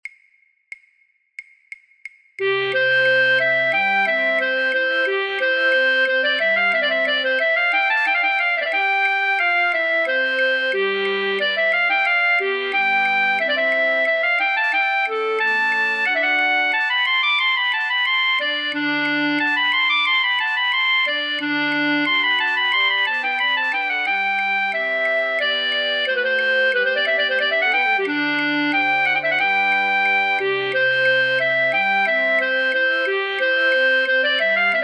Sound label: flute choir fluit; voor-oefentracks; Marche pour la Danse; Rameau; Platee